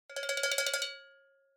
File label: Cowbell Roll